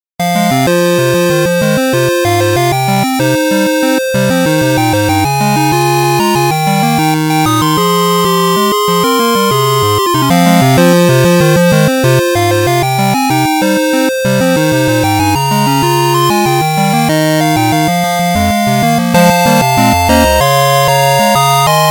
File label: 8bit chorus